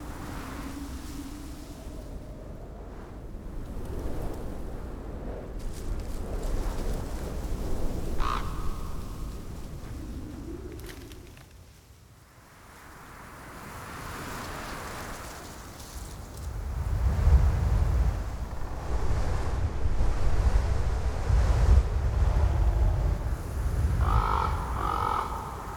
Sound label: Forest Atmos; Babushka